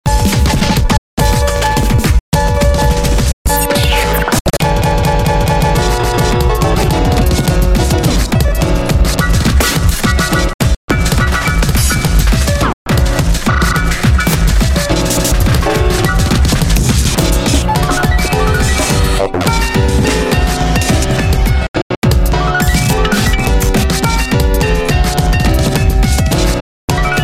bleak piano

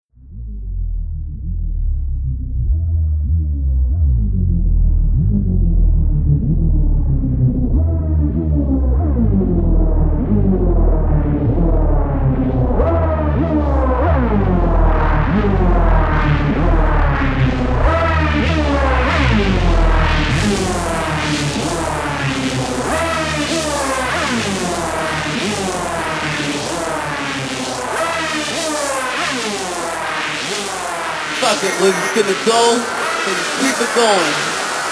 190bpm

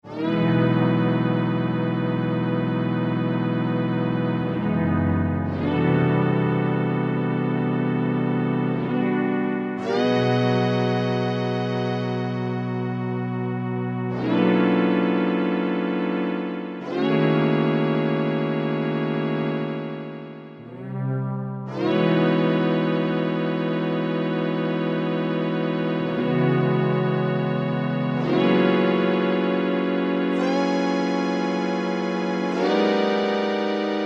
midi sketch; weeklybeats 2024

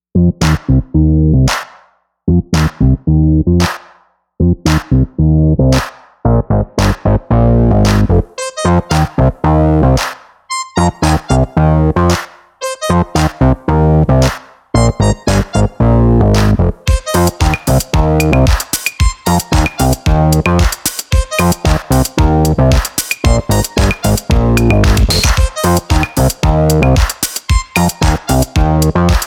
drunk funk; sexy bae; weeklybeats-2016

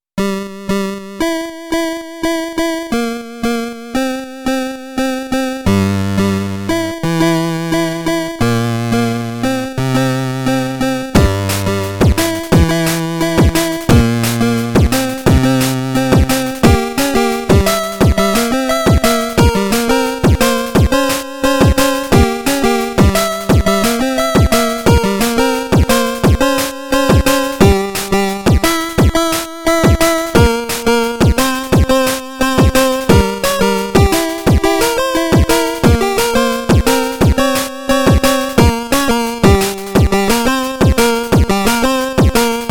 drum and huh

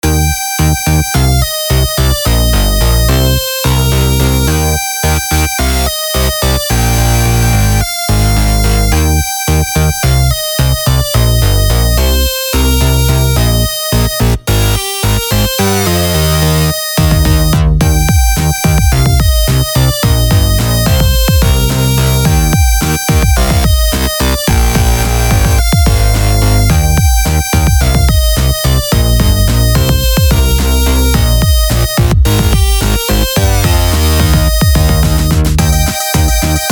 chiptune; weeklybeats-2020

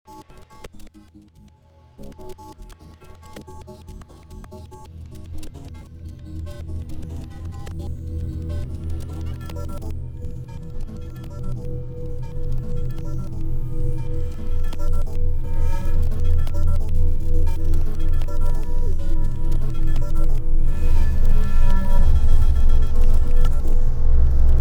common grackle

